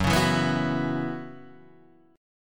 Gb 7th Suspended 4th Gb down Guitar Half Step Down, chord